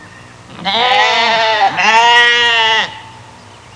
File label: Sheep bleating sound